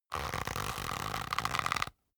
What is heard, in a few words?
leather creak soft